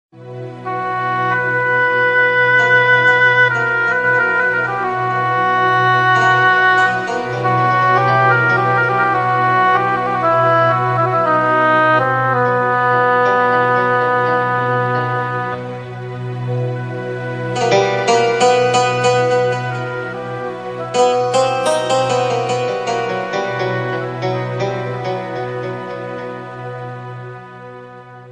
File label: welcome ramadan arabic music ringtone, islamic ringtones